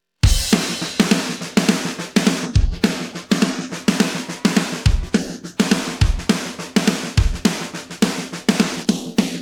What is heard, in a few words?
intro, drums